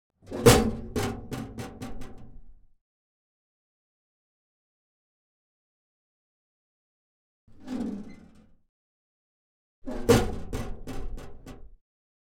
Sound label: Mail Dropbox